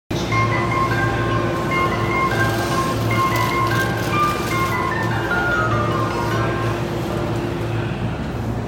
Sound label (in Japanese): JR埼京線赤羽北, train sounds